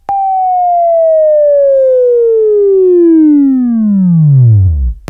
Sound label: Buzz; Labview Program; Sweeping Training program